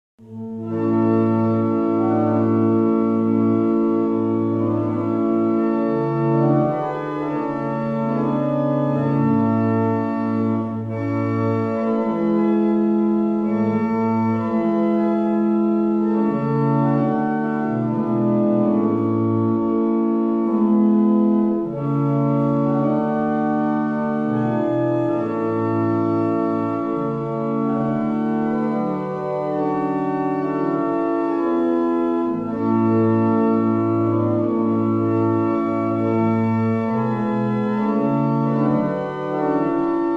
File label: New Sabbath, barrel organs; Avington